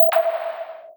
menu hit; Osu!

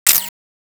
click close; Osu!

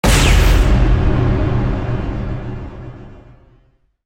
match confirm; Osu!

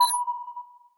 select expand; Osu!

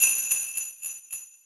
normal hit finish; Osu!; M e g u m i n v4; Original HitSounds

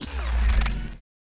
check off; Osu!

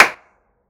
soft hit normal; Osu!

